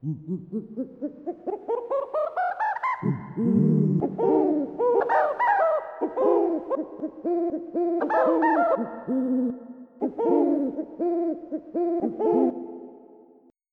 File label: owl; croc; AMBI NGHT